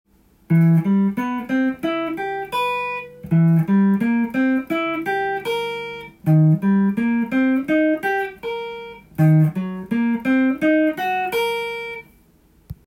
chord tone, guitar; good way to practice chord tones

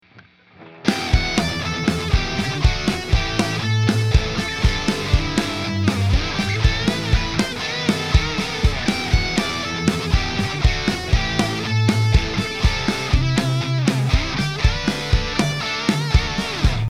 overdrive, guitar; cubase and universal audio; anti1992

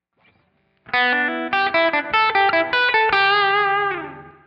E blues, guitar

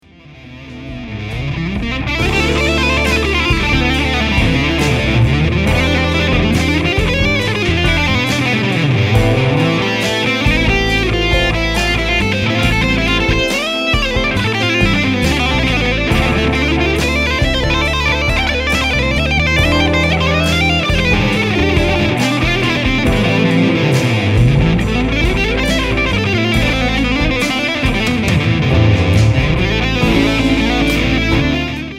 Soulful solo

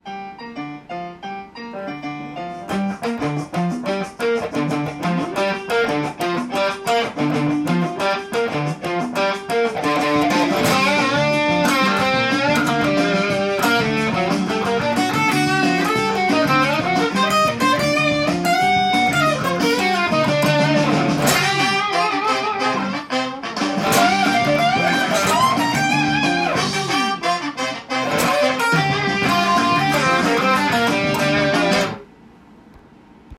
guitar

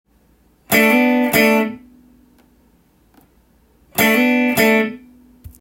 e blues; collection of short e blues phrases tab

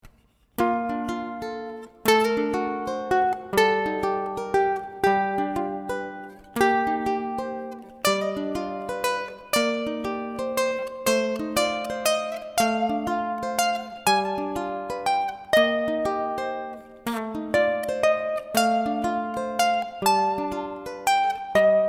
ukulele

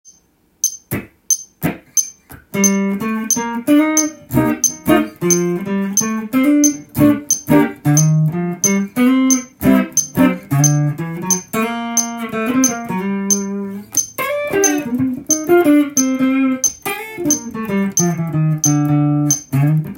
jazz metoro; solo metronome session

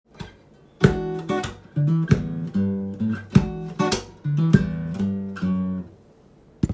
slap

